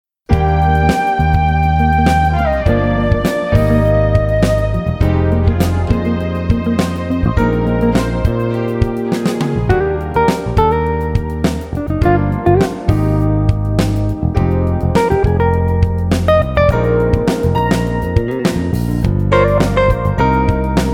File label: light jazz